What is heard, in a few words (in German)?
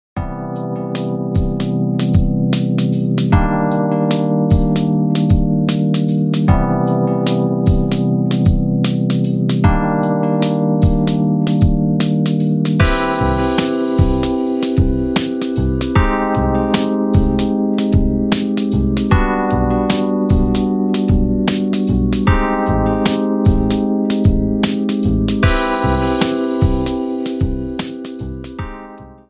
musik hoerprobe